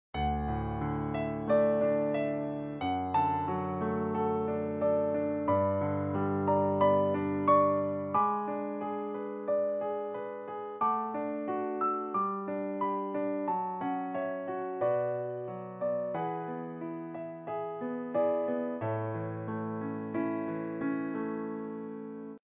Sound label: Irish Lullaby, piano; Piano app by Yokee